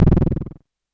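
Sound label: Klone Dual Percussion Synthesiser noise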